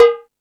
Percussion

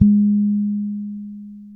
G#4, ragga, bass; Spectrasonics; Bass Legends CD 1

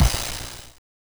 Crash Cymbal, Genesis; Sonic 3D Blast; M8

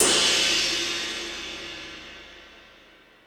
More Cymbals, Drum Machines

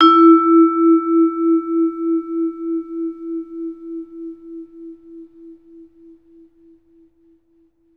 VIB, E3; Best Service; Peter Siedlaczek's Advanced Orchestra

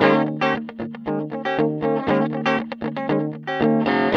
TELE 7TH E 3, guitar; Spectrasonics; Hans Zimmer Guitars VOL-1; TELE7THS

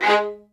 G#3, LOUD VIOLINS; Q Up Arts; Denny Jaeger Master Violin Library

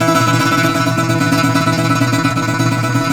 SAZ TREM E 2; Soundscan vol.53; Mediteranean Traditions; AKAI

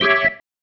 Stab, Organ, One Shots

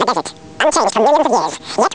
desert voice; Amiga Soundtracker Sample Packs

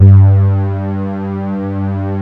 SYN KEY SOFT; Pro-Rec - Synthsonic; Z1 PADS

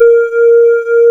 Synclav FM, WIND, A#; Big Fish Audio

